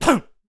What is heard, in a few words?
Boxer Death Vocals